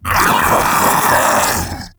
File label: Infested Splitter Joined Vocal Alerted; Grey Strain